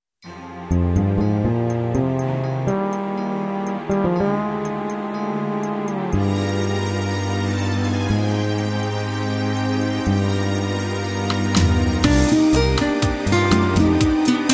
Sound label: brass minus